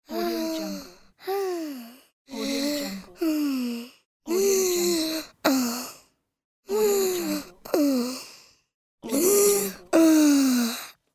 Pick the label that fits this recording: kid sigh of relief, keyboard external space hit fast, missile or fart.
kid sigh of relief